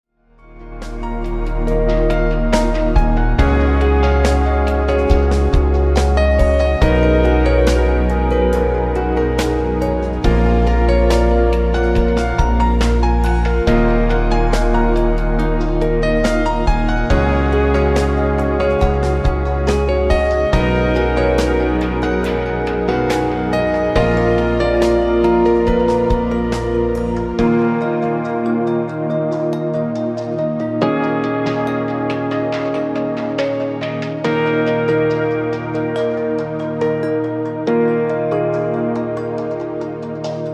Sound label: Relaxing